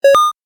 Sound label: 8 Bit Retro Arcade Item Collect; Sound Response